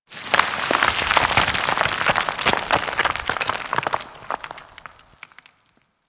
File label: Coring Rock Pour B; Warframe; Ambience; Grineer; Grn Intermediate Coring